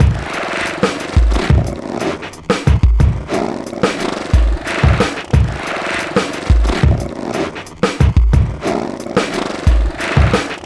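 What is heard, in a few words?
Annoya Loop, beats; Sonic Foundry (Sony Creative Software); Esoterik Beatz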